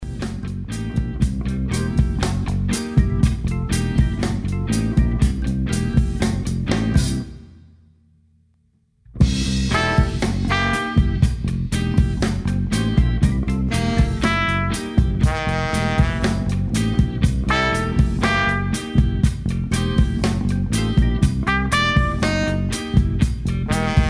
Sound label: Pop Bop